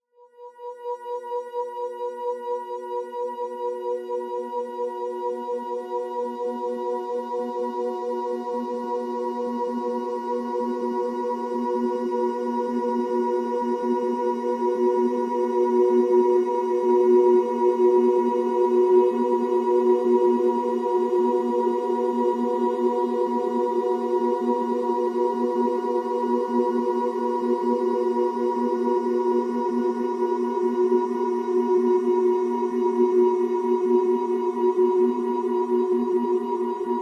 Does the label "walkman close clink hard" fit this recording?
No